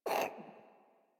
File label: Kitten Cough Hairball; Warframe; Catbrow